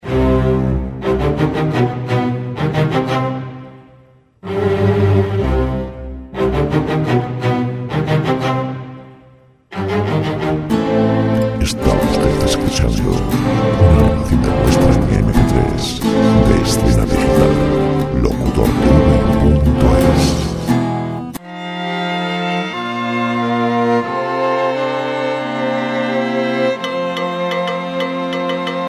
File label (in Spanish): orquesta